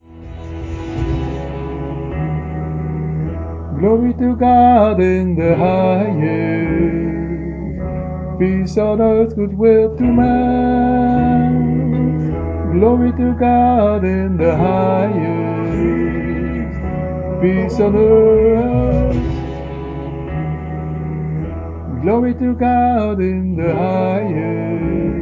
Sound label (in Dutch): kleine groep